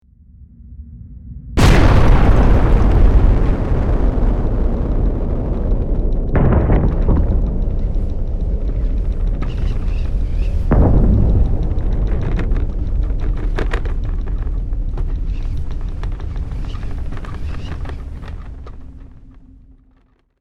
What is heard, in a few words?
Nuclear explosion deep rumble massive bomb